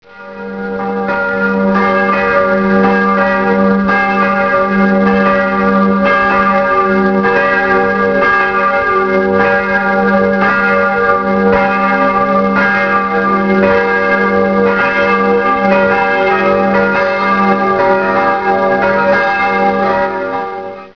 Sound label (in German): Kirchenglocken; Sakralemusik